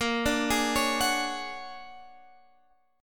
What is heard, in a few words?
Bb 7th Sharp 5th Sharp 9th Bb; Bb7#5 #9